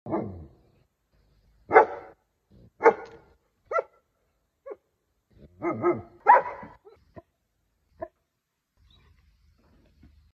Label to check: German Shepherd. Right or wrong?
Right